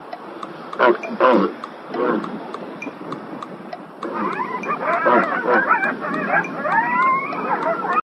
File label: metronome w walrus and coyotes